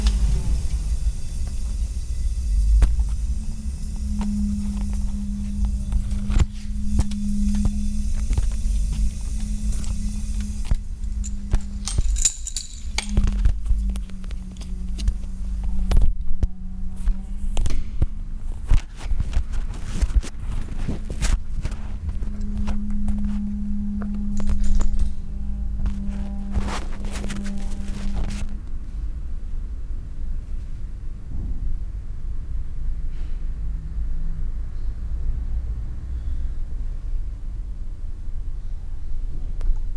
kuehlgetraet, kuehlgeraete + verkehr; Fieldrecordings

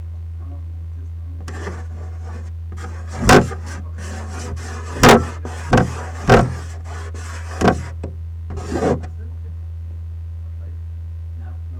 tower plaste finger

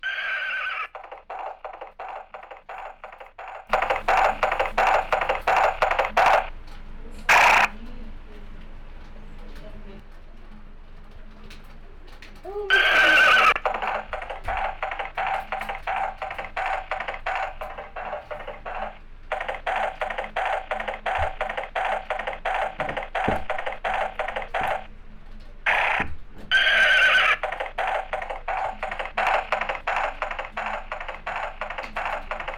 Pferdchen Lauf Galopp, Brasilien

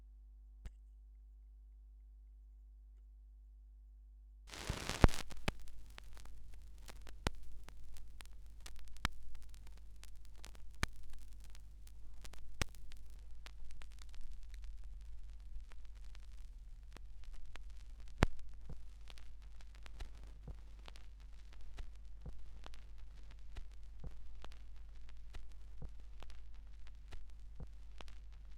schallplattengeraeusche

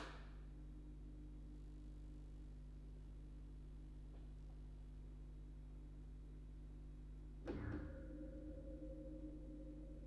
Aufnahme Spieluhren Quartett 4 Kanal stereo; Tonband; Fieldrecording

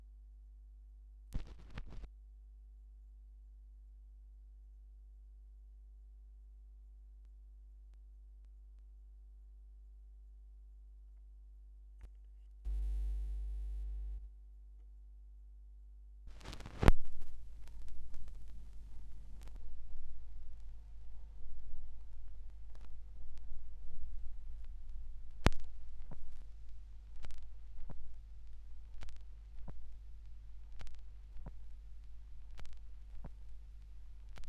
Schallplattengeraeusche; rauschpartikel

